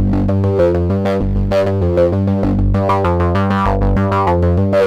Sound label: philter (seq); Sonic Foundry (Sony Creative Software); Ugly Remnants VOL-2